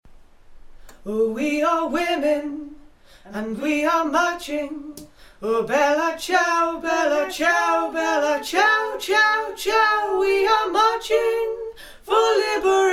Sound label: Bella Ciao tune